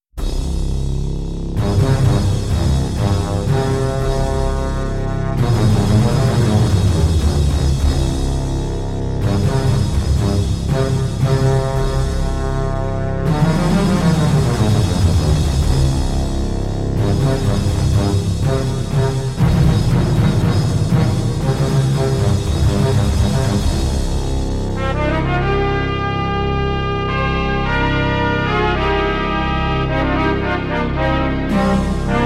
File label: Orchestral; John David Thomas